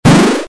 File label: Enemy create